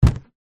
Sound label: balloon inflated catch; foley